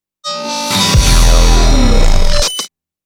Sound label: Game Over; Tekken Revolution